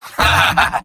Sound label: Medic laugh evil